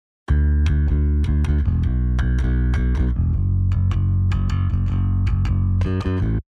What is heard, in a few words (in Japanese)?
R Bass Ba あり